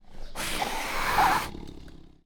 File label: tiger hiss